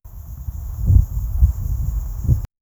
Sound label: bird